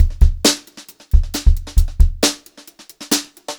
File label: beat, Brushed Drum Loops